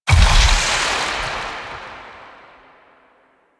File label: Shadow Particle Death; Warframe; Duviri